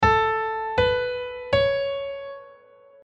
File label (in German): drm A dur